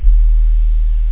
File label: sub base, bass, synth; da capo vol1